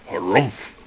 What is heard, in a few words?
ROB NOISE; DINOS